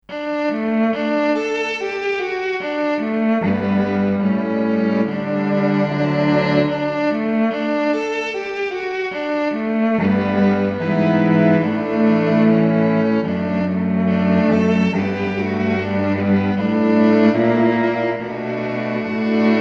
Cello Tape; VIVO S10

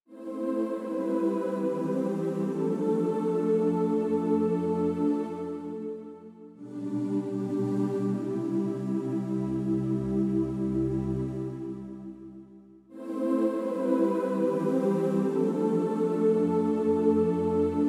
Space Vox; VIVO H10

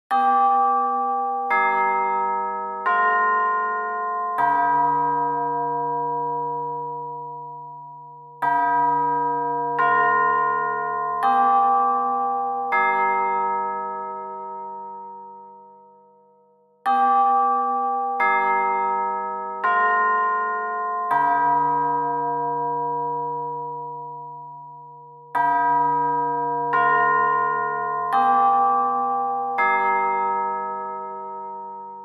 Tubular Bells; VIVO S10

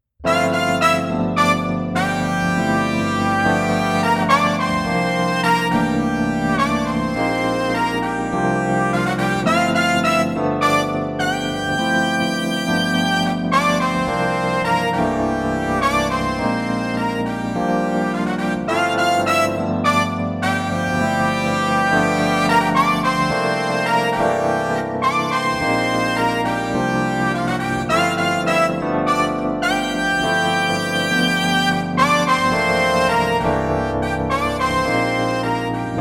Brass Section; VIVO S10

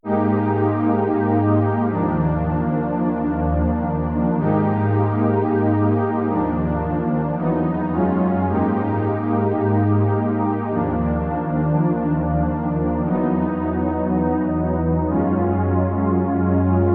80s Strings; VIVO H10

